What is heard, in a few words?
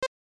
Toggle Option; Space Invaders; Super Game Boy; SNES